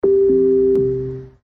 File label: AMAZON ECHO POWERING OFF ECHO SHOW